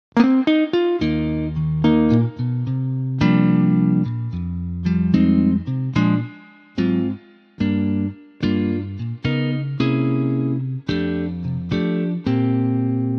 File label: Elec Gtr